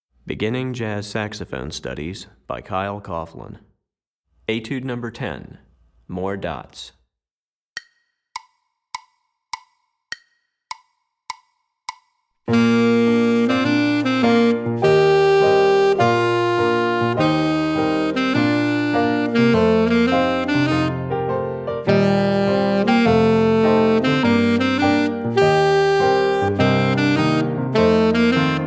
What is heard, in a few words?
Alto Etude, Saxophone; Beginning Jazz Saxophone Studies Alto V1